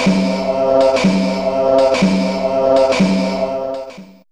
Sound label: Tibet C4, Fairlight CMI IIe; TremStr